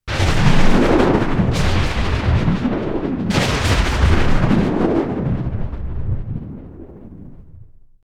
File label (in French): Orage